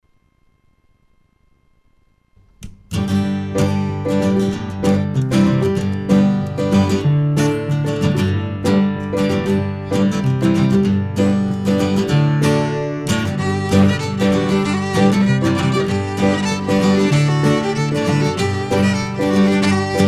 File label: Mountain Lark, Irish Group, fiddle tunes; reel, pt 2 of Irish Group